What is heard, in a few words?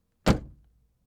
Car Door Slam